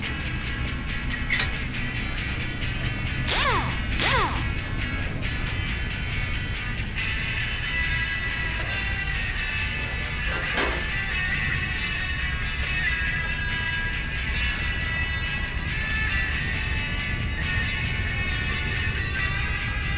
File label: music garage; Ty The Tasmanian Tiger 2 Bush Rescue; XBOX